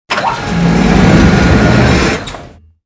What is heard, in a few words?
ramp slide